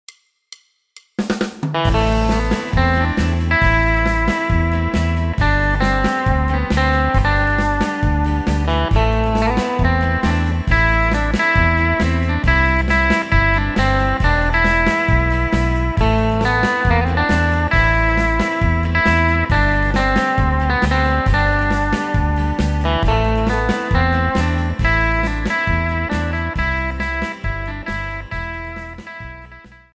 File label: Shadow Gtr